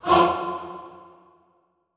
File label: HAH F2, Classical Choir, SHORT HAH; Best Service